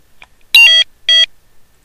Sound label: Error